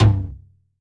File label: FLOOR TOM, ELECTRO KIT